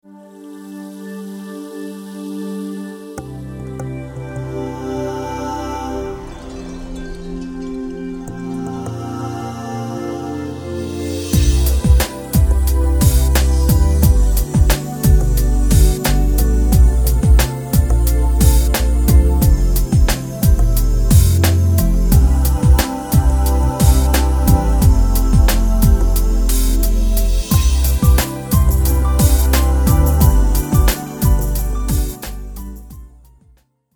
Chill Out Groove

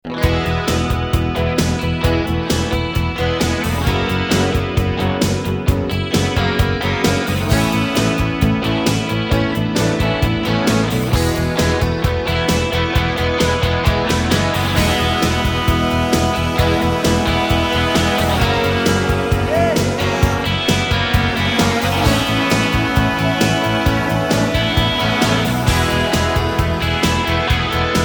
Alt Rock

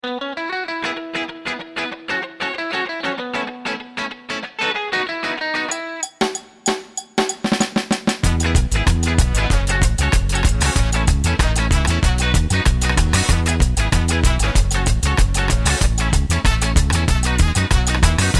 Ska

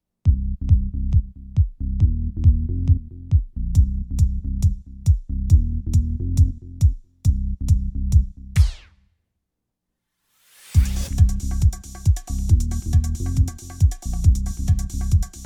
Dance Electronica

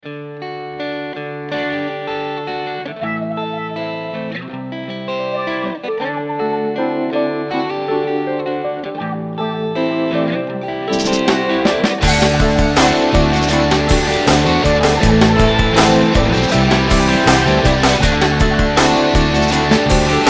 Alt Rock

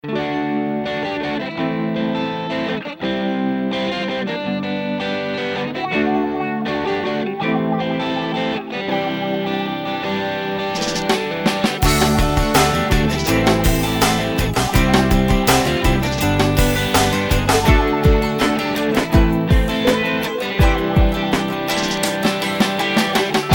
Alt Rock

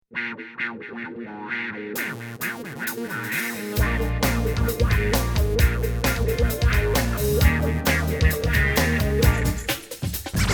A Bit Funky

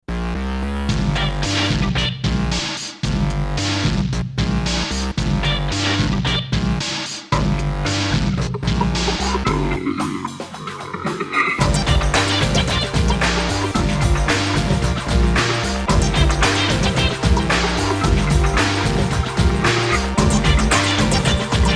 Raucous

